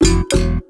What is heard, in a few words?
Bass conga Denied Ding; Robinhood76